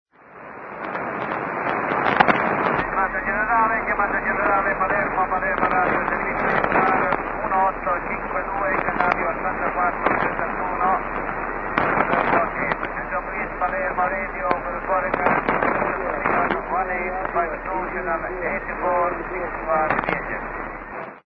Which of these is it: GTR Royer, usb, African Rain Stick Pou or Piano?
usb